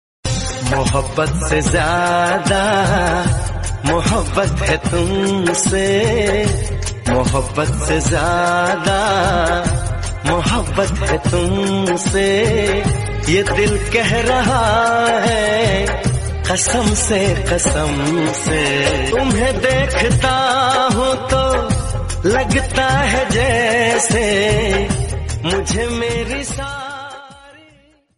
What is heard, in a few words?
Romantic Hindi Song